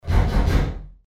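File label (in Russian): звук кулаком в деревянную дверь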